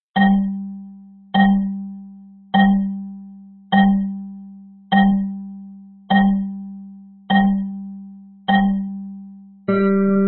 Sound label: Sixteenth Note Rhythm Study 51bpm